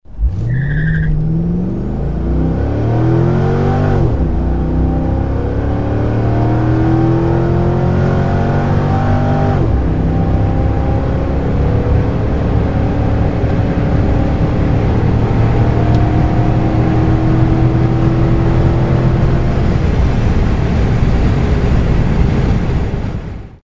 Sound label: Chevrolet Corvette 0-220 kmh; biler